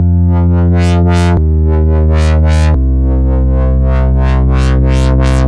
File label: BASSLP, Bass Loops; neuro; Stanza